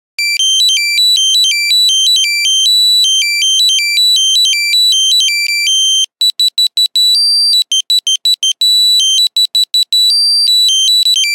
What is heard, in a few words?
6210 Reveille, Nokia Buzzer; Windows Phone apps